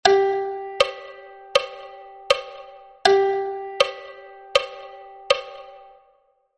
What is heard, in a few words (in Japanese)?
全音符